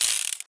cylinder spin, mr96; garrysmod

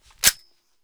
desert eagle slide stop, weapons; garrysmod

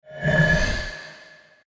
repel; cstrike; war3source; omniknight

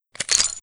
bullets out, weapons, colt python; garrysmod

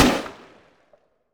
distance fire; explosive; garrysmod

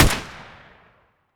sr25 fire, sniper; weapons; garrysmod

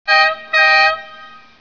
lambo horn; garrysmod

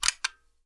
m24 load, sniper; weapons; garrysmod

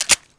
mp5 mag tap; garrysmod

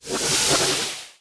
feedback; cstrike; war3source; spellbreaker

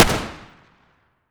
ots33 fire, pistol; garrysmod

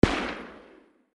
shotty, distant sound; garrysmod

